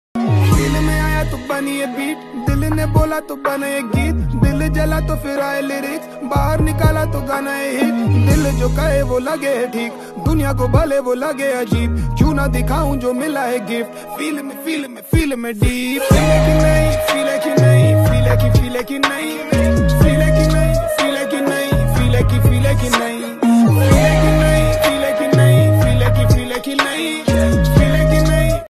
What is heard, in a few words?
hindi rap ringtone